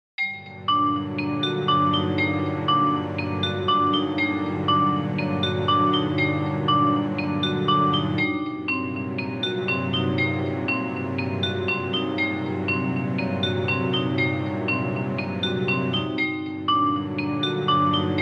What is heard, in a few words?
vibraphone